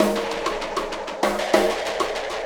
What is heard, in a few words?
Ripple Perc; Sonic Foundry (Sony Creative Software); Processed Drumkits Zero Gravity Beats